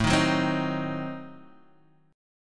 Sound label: A Diminished 7th, Guitar Half Step Down